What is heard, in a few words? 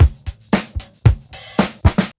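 BEAT right, Roots Disco; USB Soundscan vol.32; AKAI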